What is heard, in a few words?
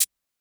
perc shaker; Hip-Hop; Drum One Shots; SD CAICOS Hip-Hop Sample Pack; Slate